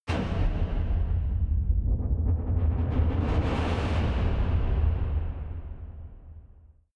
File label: massive metal rumble